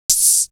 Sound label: Beatbox